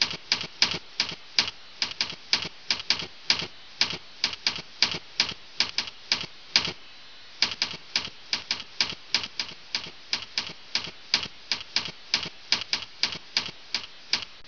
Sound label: typing; de ivytown